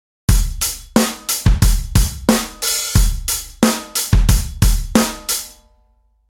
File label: hat